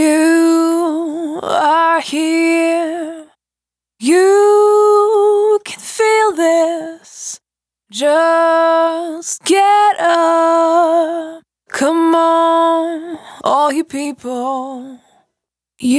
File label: Light, Single Voice Melodic Loops; Singles